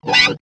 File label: wah flange, Guitars